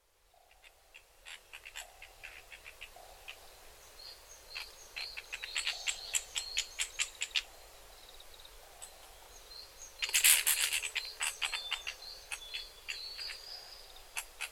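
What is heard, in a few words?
kibitaki, Narcissus Flycatcher; sasayama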